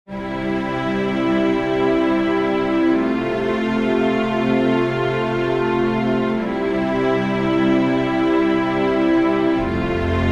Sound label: Underscore PAD; Worship Flow